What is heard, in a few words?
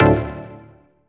Synth Shot; Vengeance Samples